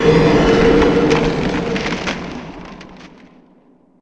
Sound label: ice barrier; wow